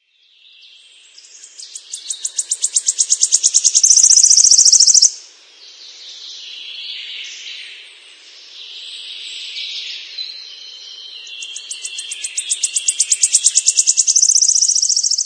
Forest

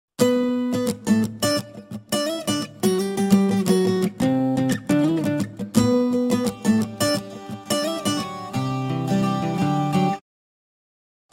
romantic life, ringtones

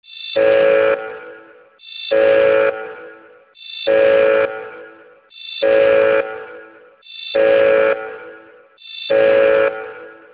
Ship alarm

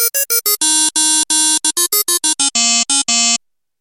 phone tone; children

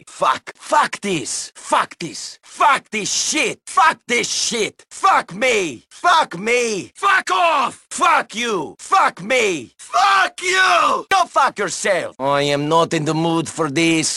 Is Calling Pop

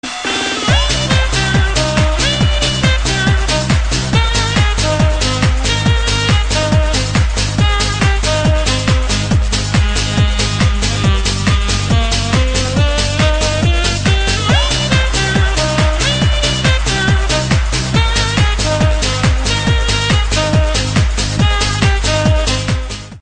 Sexy Sax

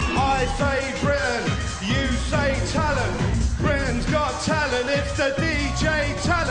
dj

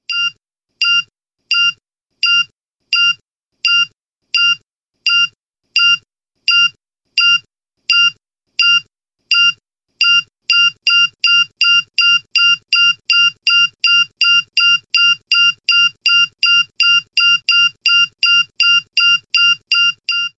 alarm

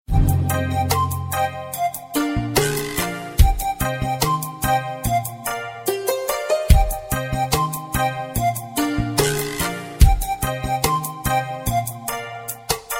lovely tune

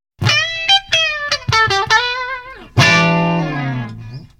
guitar solo

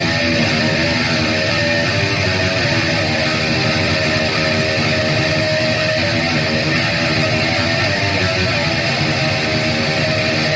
METAL

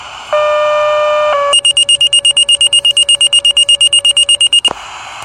Pager Alert

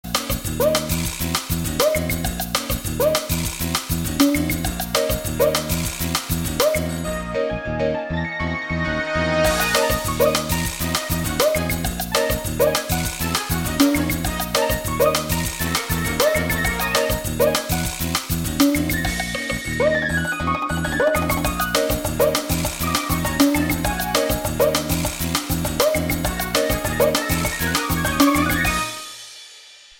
Nokia 9500

